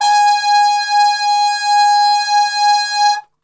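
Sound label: G#2 trumpet cup mute; Best Service; Brass Super Section; TRP CUP MUTE; 90 s Sample CDs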